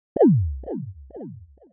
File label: erase